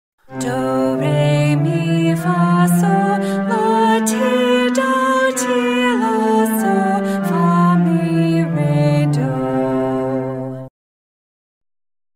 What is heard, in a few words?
Scales and Triads do re mi